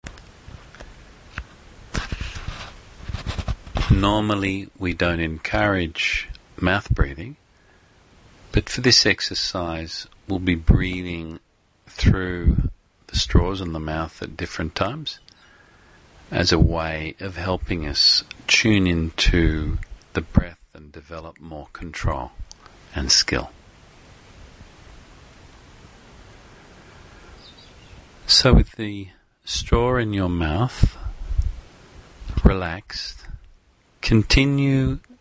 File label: Straw Breathing; Yoga Teacher Training; Breath and Mind Body Connection; Breath Practices